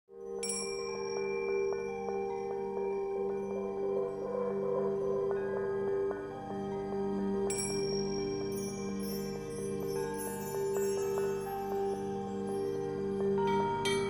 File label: Sound Impro, trance; Frau von Cholula